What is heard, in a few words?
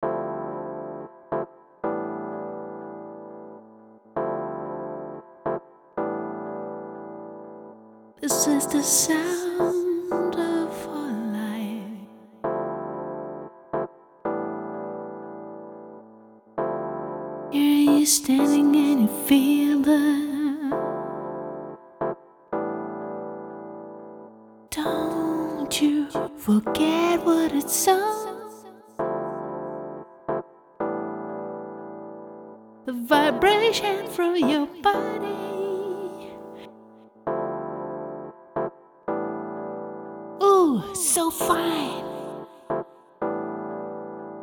vocal attack